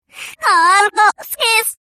clock, reverse

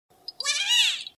"yahaha"

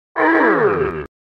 whomps; cave

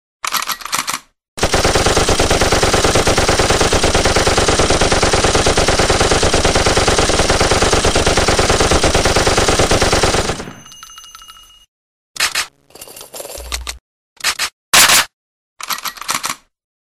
gun; cave

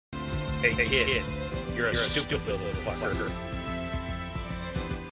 kid; cave

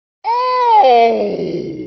OOOHHH

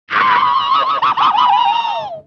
yell; cave

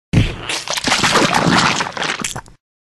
splat; reversevape

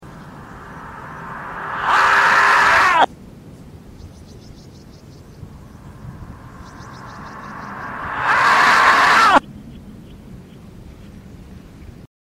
marmot; reverse

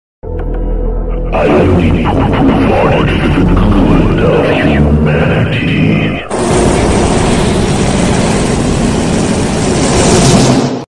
poo poo fard; cave

